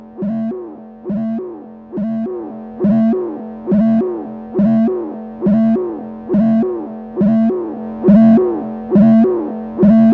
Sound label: sprott cp mod, synth